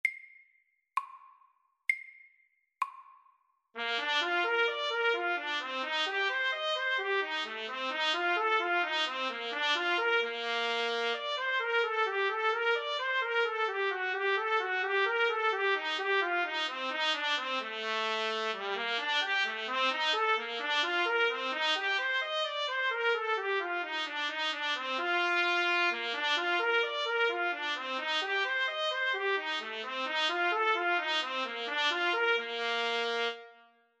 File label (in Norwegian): Arpeggio etude MIDDELS